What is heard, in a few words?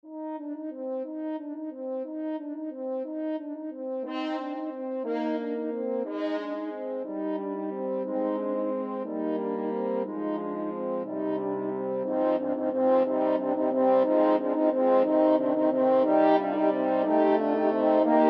french horn quartet